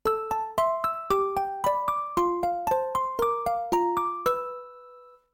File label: Marimba